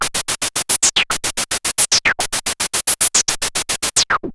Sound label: MiniBrute 110BPM, Gated Seq Noise; noise hiss crackle